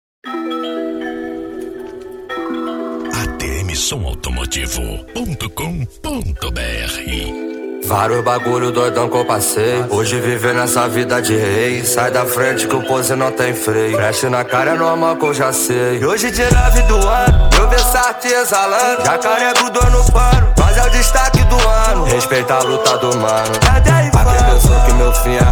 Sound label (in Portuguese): som automotivo